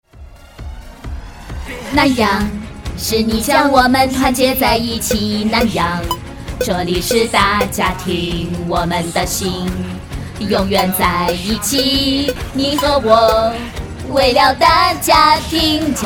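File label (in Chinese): 唱歌集团歌; 南洋电器集团歌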